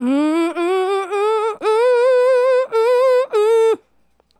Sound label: Female Solo, Hip-Hop and RnB Vocals